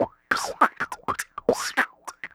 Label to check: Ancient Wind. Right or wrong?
Wrong